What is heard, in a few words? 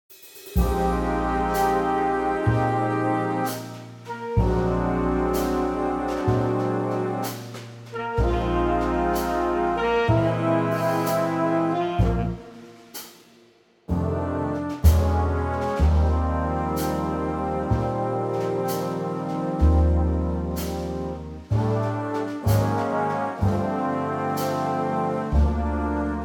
Big Band Eb